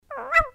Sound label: monster; petparty